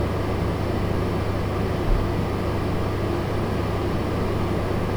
Indoor Machine Room; Dystopia; injection

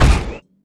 heavy dirt, footsteps; player; heavyfeet; Dystopia

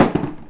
cardboard hard; Dystopia; physics

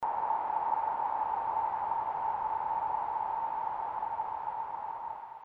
ambient scape; Dystopia; meatspace

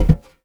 metal vent, footsteps; player; Dystopia

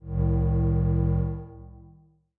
rod alarm; Dystopia

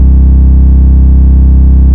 electric hum; Dystopia; injection

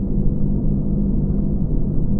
corridor, detonate; Dystopia

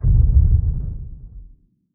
underwater explode; Dystopia

